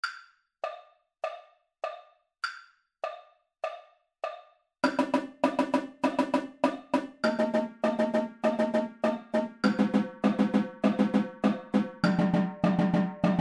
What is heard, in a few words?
Triple Beat 100 bpm Tenor